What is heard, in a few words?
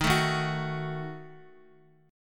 Eb Diminished Eb down Guitar, Ebdim chord; Ebdim strummed; JGuitar